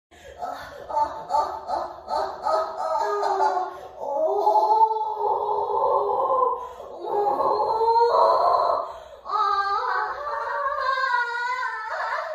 ガチオナ, ディルドオナニーで絶叫オホ声

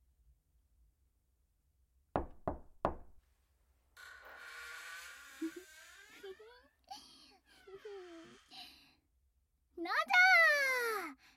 耳舐め, ASMR; メイドアメリー様のご奉仕耳舐めからの角ハンドルでハードイラマチオ

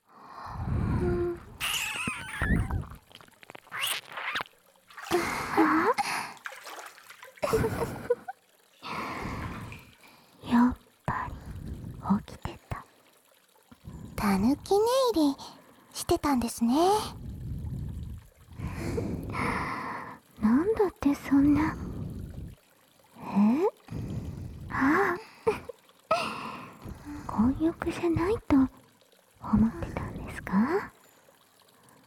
お湯の中でコッソリ手コキ; 旅先で出会った温泉女子達と生ハメ混浴温泉

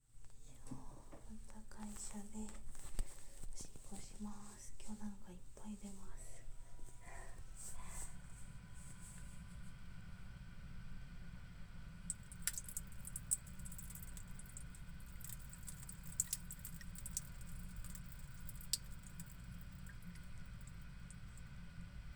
会社のトイレでオシッコ

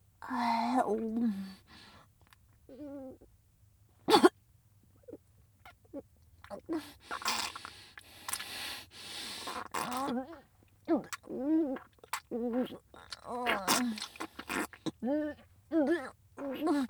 フェラC(下品イラマチオ); 清楚ビッチエルフ姫のメガ盛りマントロフルコース

